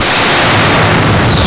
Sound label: Impulse Responses, Front Left; Ambiovolver